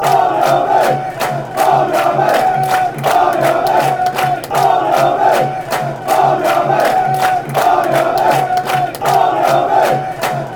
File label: barmy army, fan chants; chesterfield